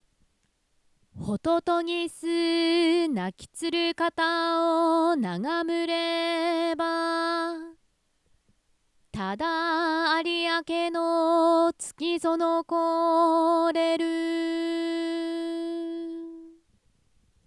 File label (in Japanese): hototogisu